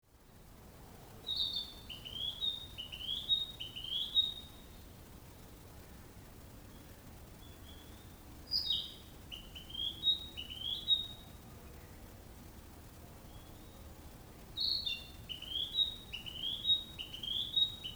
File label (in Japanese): kibitaki